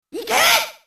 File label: attack; snes; smas; uranus